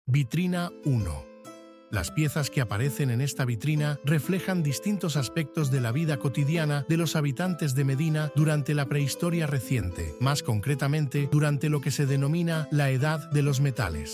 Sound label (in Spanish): audioguia música